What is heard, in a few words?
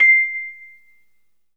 Rhodes fast, 3D Keys; 3D Sound; 3D Keys VOL-1